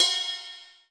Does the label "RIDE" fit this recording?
Yes